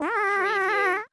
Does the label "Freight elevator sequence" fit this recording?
No